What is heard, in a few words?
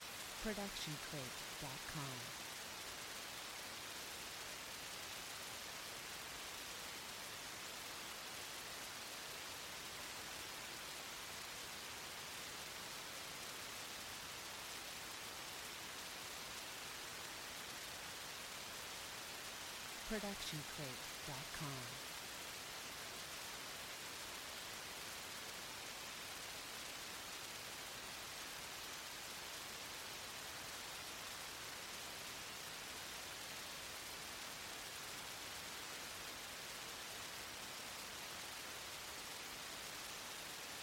rain; soundscrate